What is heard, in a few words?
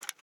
Click